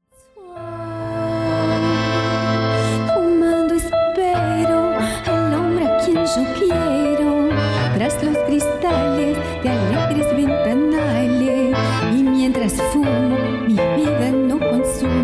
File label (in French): tango milonga chant